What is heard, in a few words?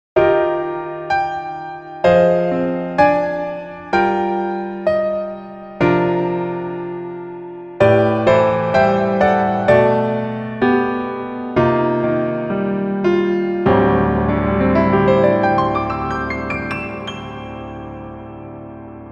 Piano Ver